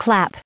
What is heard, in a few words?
clap